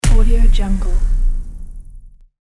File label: impact thud